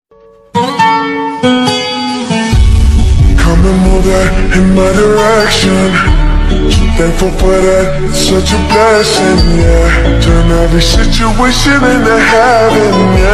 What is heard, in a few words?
(lofi remix) english ringtone